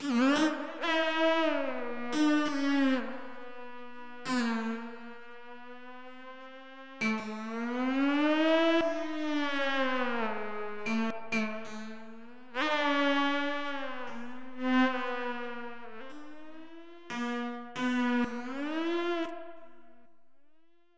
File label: drum